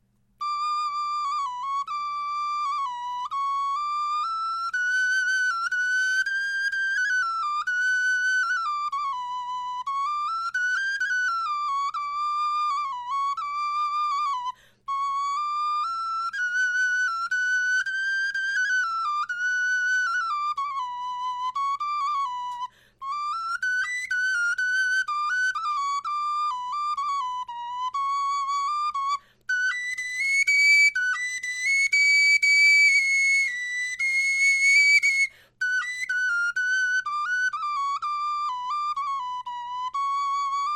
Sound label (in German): melodie, knochenfloeten